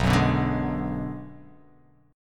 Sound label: B Minor 6th B